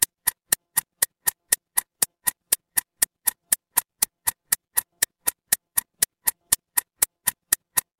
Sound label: mechanical clock tick